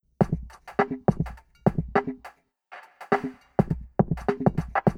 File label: drums banger stone1 0m